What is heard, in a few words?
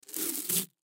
Lens Cloth Tear, Lens Cloth Tear Sheet From Package; Ripping (Sound Effects:Objects); Blastwave; Foley